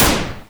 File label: gun; madcars2; radicalplay